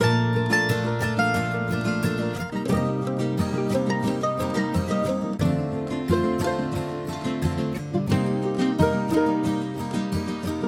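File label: Guitar music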